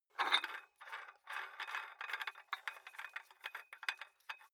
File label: dumbbell open nut thread, fitnessstudio